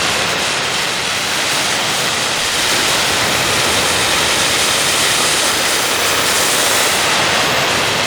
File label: road flare burn